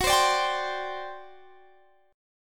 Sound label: G Major 7th Suspended 4th, F sharp